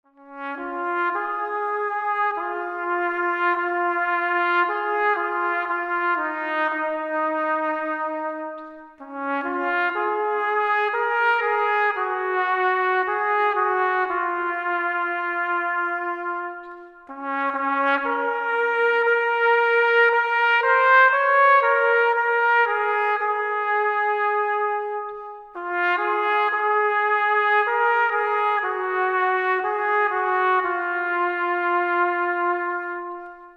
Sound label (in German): Hohe Tannen Tromp